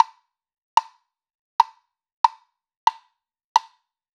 Raw Perc Woodblock

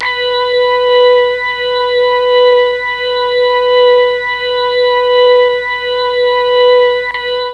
B3 ORGAN, Mellotron MKII ORGAN; Mike Pinder - Mellotron Archive CD

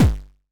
kick crumpled; neuro; Sinistarr; Drums

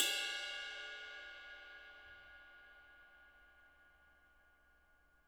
RIDE, RIDE CYMBALS; Spectrasonics - Backbeat

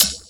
Hhat, Hihat; Equipped Music; Loungin House

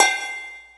perc, Percussion; Trance Explosion Vol1

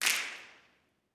snaps, gospel; ILIO; Vocal Planet

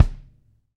BD, Roland; Sound & Vision - Gigapack I

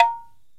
PERC KALIMBA

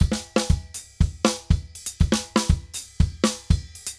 drums, merengue; World Grooves; Best Service ProSamples vol.14; AKAI

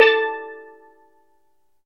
steel drum

